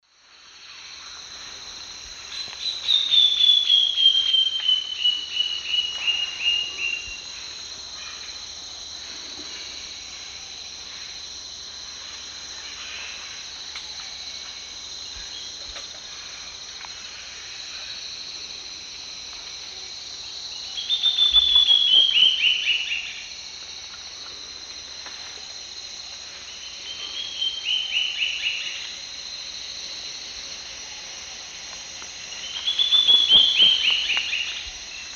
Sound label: Sclerurus caudacutus, 2 song types; S. America; Eastern Lowlands